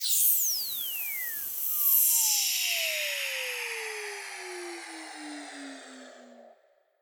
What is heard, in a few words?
Bullet Extraction; Plastic Surgery